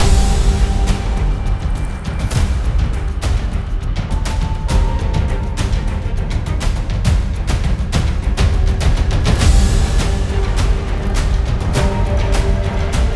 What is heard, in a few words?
Library Trailer